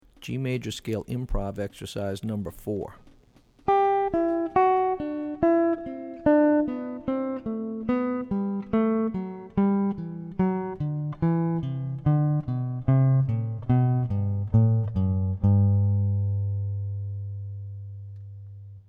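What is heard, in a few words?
G Major Scale Improv Exercises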